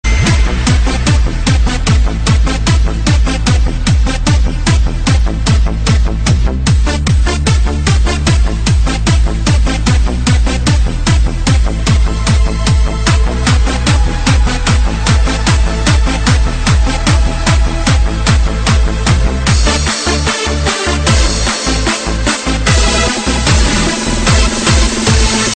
Tetris trance remix

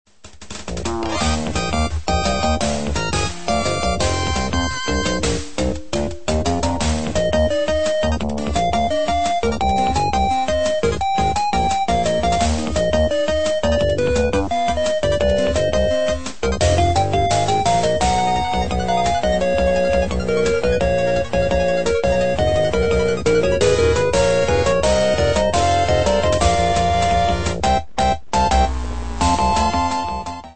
ring tone; lucky star; konatas

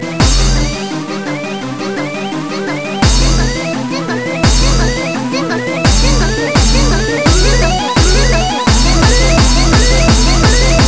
Is Calling Rap, ringtones

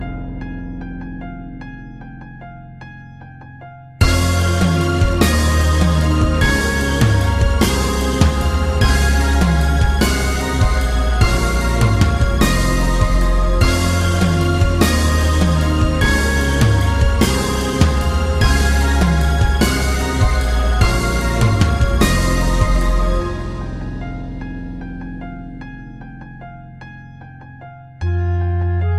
magic bell mix

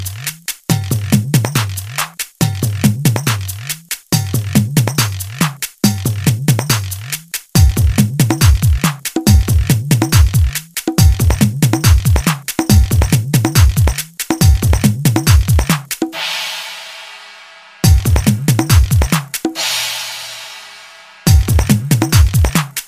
beatz tabla

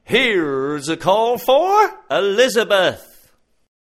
Is Calling Rap, ringtones

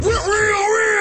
real rap; hip hop

